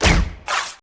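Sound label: weapon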